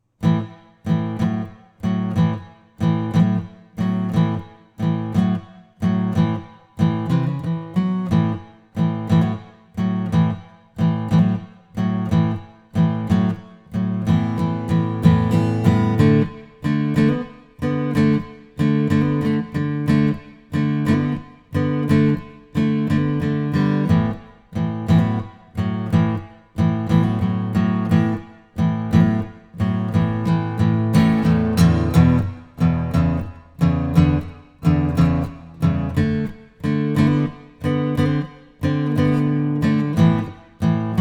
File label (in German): se electronics t1 kondensatormikrofon akustikgitarre